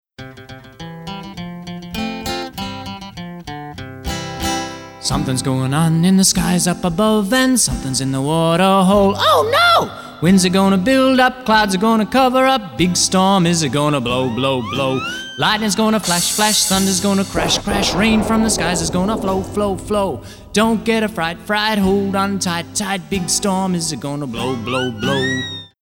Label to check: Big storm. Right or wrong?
Right